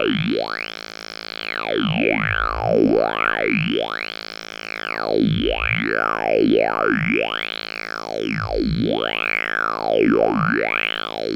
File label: filtermod pulse input; QUAD TIMBRE BANK